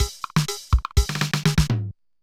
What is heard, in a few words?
Loop, mxr; classic machines